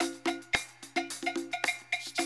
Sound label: 110, Classic Drum Loops